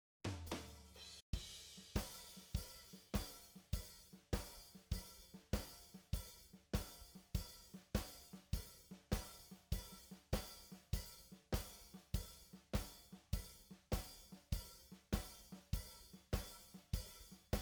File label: Drums stems; 8 channel